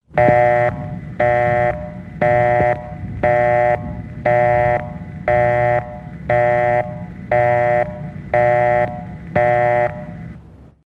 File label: telephone busy signal; wake-up tones